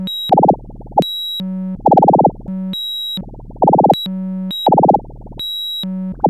MACHINE TALK; Zero-G Ambient 1

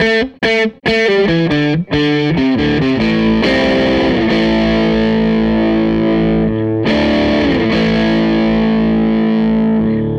Les Guitar Loop

